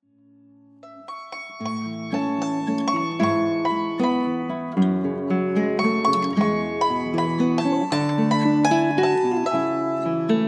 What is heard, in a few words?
Winter Waltz